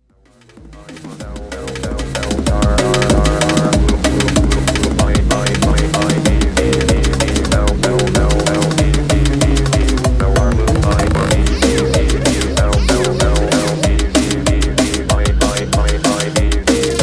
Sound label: fight music remix